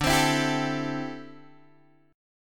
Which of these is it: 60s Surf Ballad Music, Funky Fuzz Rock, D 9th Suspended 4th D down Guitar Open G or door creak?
D 9th Suspended 4th D down Guitar Open G